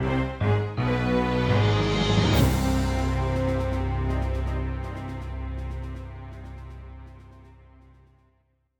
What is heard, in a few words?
Fanfare; Stonekeep; ms-dos; windows